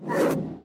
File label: scene wipe; Scene Wipe/Zoom sound effect; Jojo's Bizarre Adventure